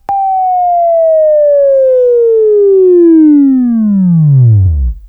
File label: Buzz